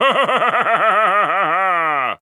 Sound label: Wizard Laugh